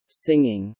singing

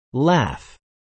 laugh

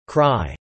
cry

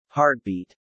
heartbeat

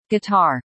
guitar

